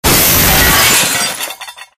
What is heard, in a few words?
shredder death robo; ambient; Source Engine; tf